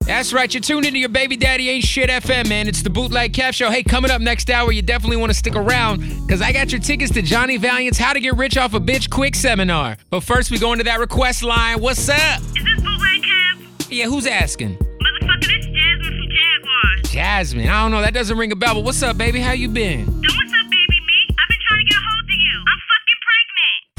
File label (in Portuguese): Quadrilha Rap